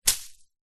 foley plant fern broad leaved hit solid hard ground